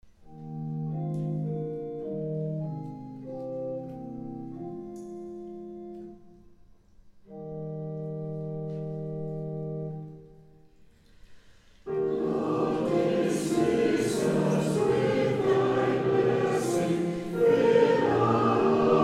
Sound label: lord dismiss us with thy blessing, Northern Choir